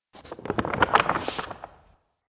Pirate Treasure Map, Cartoon Sound Design Sound Effects